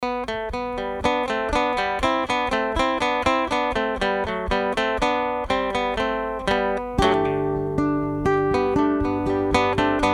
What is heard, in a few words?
acoustic Musette; Naudot